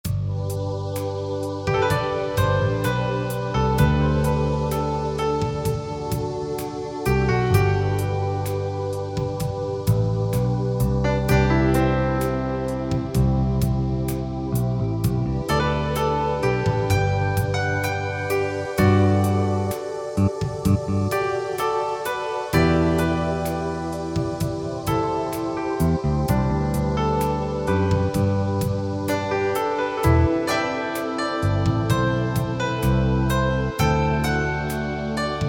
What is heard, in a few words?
Flying Piano